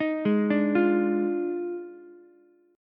D sharp Major 7th Suspended 2nd Suspended 4th, Ukulele; arpeggiated; JGuitar